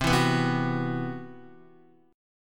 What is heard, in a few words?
C Major Sharp 11th, Guitar; strummed; CM#11